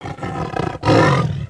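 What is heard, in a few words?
Saber Tooth speak, creatures pets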